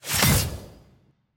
ranked ui promo loss; postgame

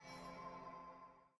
ui small button hover

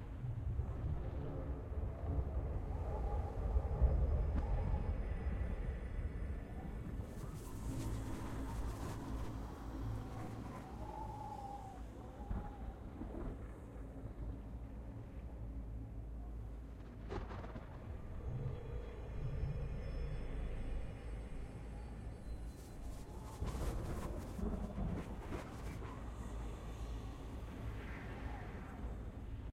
amb targon plateau

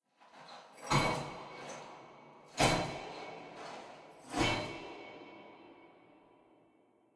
walk creep

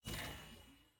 pass bundle button hover

